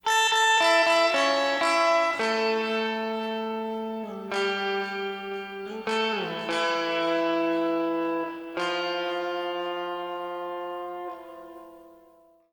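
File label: electric guitar riff original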